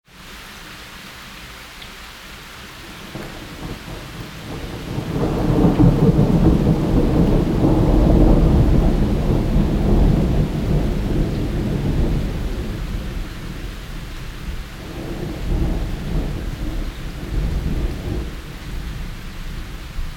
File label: rain and thunder